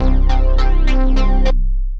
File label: Uni-Sun, Synth Lead; Ableton; Factory Packs; Synth Essentials